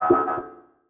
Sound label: Multimedia Internet CD-Rom Flash Beep Multiple